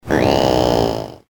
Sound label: mongoose long grunt